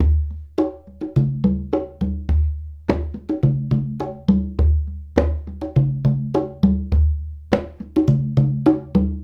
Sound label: Congas; Essential Percussion